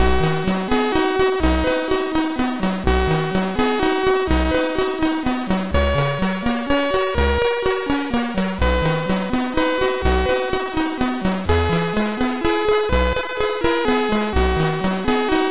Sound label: X-MAS Music, sid MOS6581R3